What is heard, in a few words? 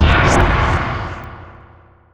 blinkstrike; weapons; dota; riki